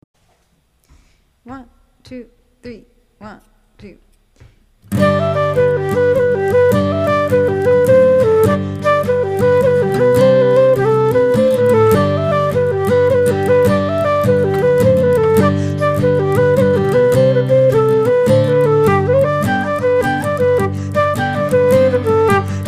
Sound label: Flute; Drops Of Brandy